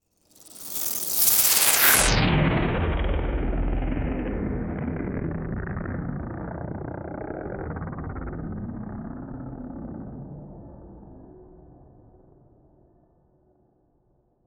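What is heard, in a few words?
a suspenseful fade in documentary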